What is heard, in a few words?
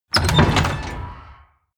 door metal open